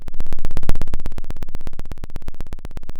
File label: Sound of a lion’s roar